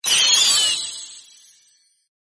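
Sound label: success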